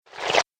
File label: App Game - Sticky Splat; Splat Sound Effects